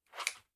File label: Belt, Clothes